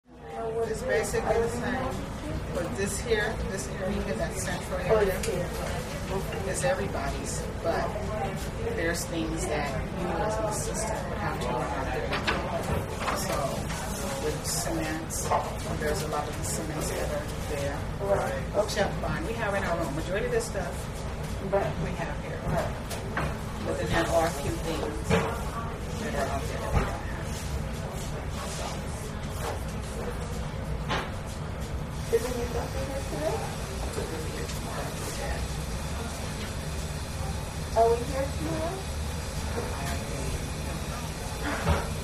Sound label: Dentist Office: Reception Area Walla, With Phones And Paper Movement.